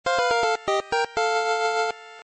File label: levelup